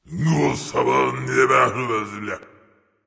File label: grumbling; smite; voicepack; raijin